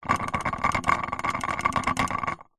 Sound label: lamp plastic shake wobble loose rattle; household; zapsplat